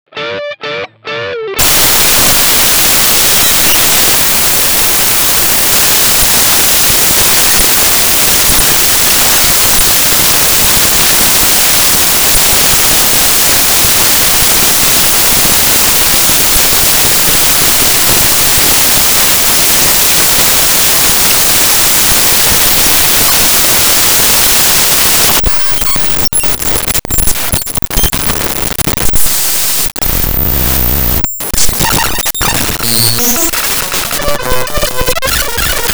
Strat Overdrive Lead

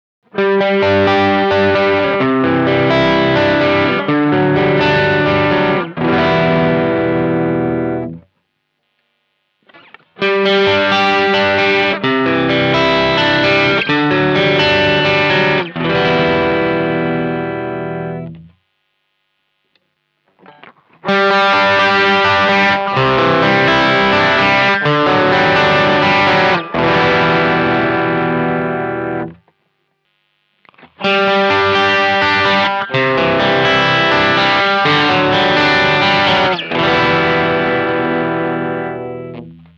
Bucker crunch

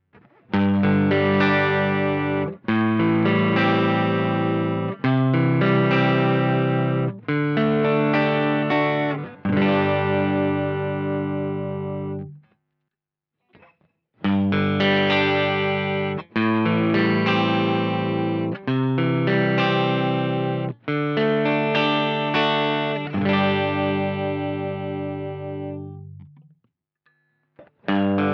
P90 Neck

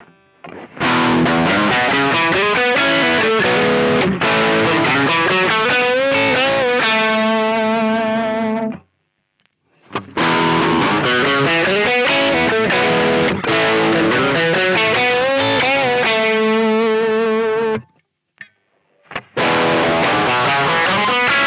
Classic 5 OD

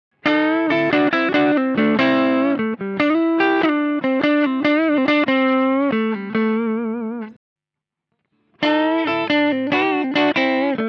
P90 Bridge

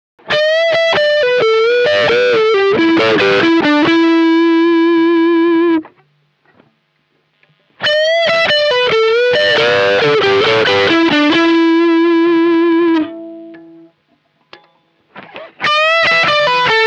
S Bucker OD

